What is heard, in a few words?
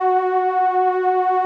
SOLINA, String Machines